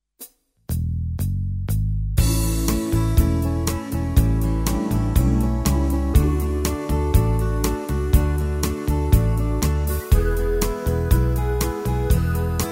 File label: Smoky Beat; tyros2